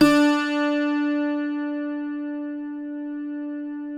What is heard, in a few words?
cuatro C; Spectrasonics; Hans Zimmer Guitars VOL-2